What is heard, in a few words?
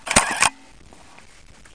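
photographing on a polaroid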